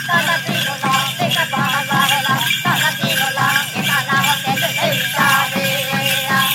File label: dwarves dance; 摇钱树